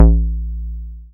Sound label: Bass SH-101